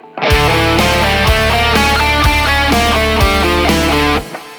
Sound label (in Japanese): ハーモニックマイナースケール